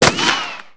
sparo, armi

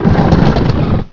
porta scorrevole

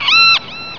gabbiano

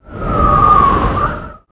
Vento, natura

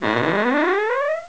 porta apertura

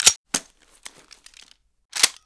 ricarica, armi

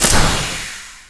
razzo, armi

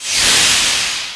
Vapore

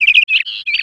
Canarino

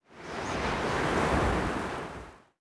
onde del mare, natura

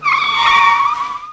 Frenata, motori

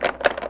maniglia, porte

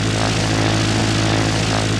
aereo ad eliche

